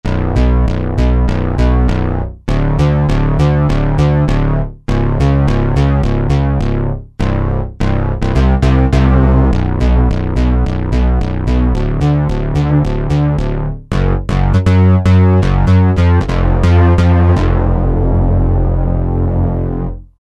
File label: Beef Bass, vintage synth; Roland SR-JV80-04